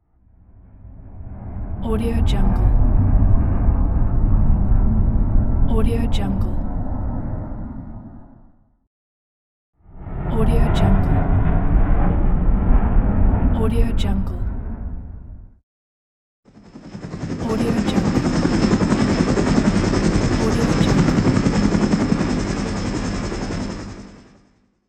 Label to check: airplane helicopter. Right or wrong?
Right